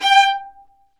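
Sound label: Marcato, Strings; Vlas Marcato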